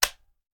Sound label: Machine Gun Foley; Sound Ideas; Guns